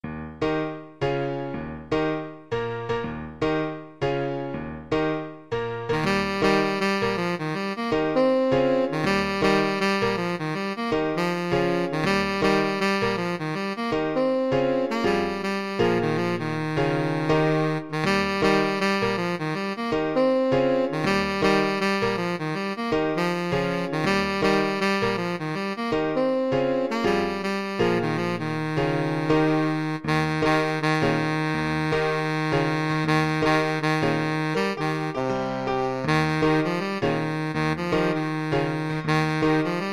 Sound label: tenor saxophone